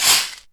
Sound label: DM5 Perc, Alesis DM5 drum machine